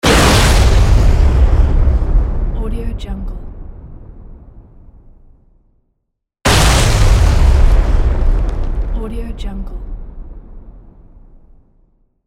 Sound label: bomb explosion